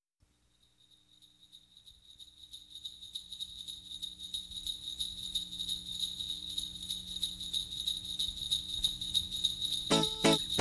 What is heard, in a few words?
Jingle Bells Instrumental